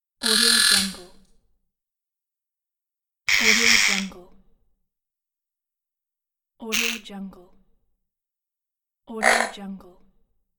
servo electric gear

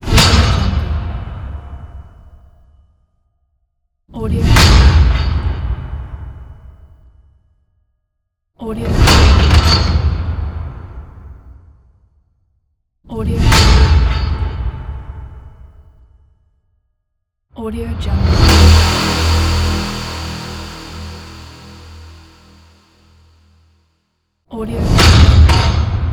impacts

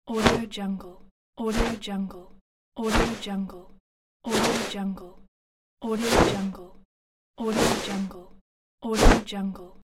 sweep wave

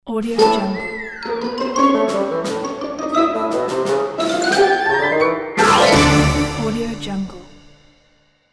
funny cartoon logo; robert kincaid

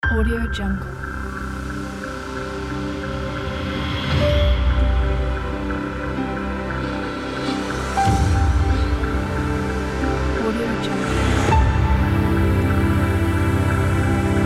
sublime ident; sachinjoshi

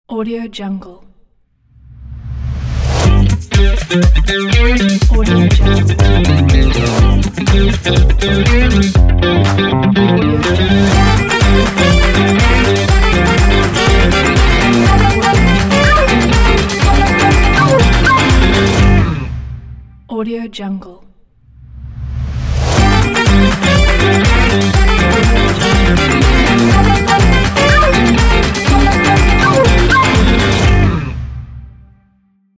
upbeat funky logo

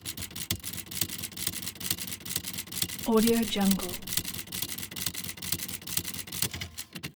hammermechanic erase; soundbits-soundfx

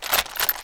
mechanism short robbie innards; soundmorph

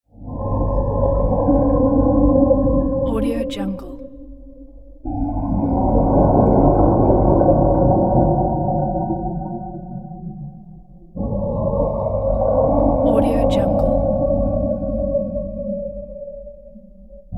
waterphone burst tonal vocal choir reverberant

